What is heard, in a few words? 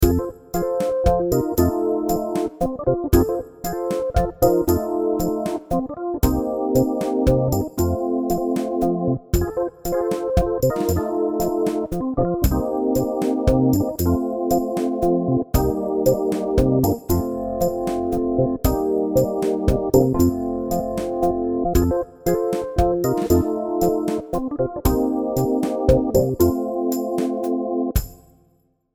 No Melody, ukulele; Rainbow Ukulele